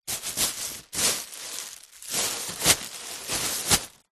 foley plastic packaging sheet short handling movement